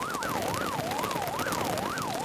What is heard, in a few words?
Bats Circling; Grimm